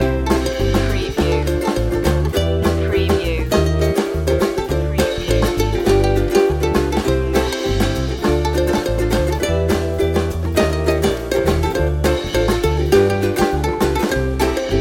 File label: swing loop